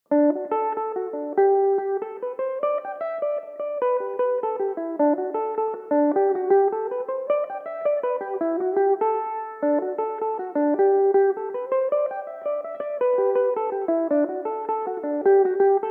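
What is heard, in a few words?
G major belmuse, irish mandolin; The Turoe Stone; Belmuse EM-190 electric mandolin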